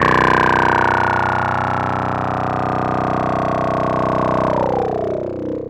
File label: BUZZ BASS; Zero-G Ambient 1